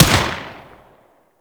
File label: Bladed Pistol Shot; Warframe; Weapons; Tenno